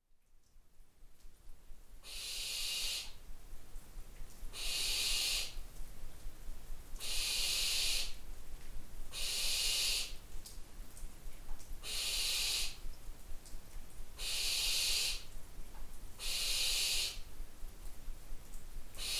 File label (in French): jeunes effraies au nid